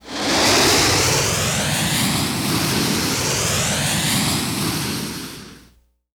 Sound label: Rocket whoosh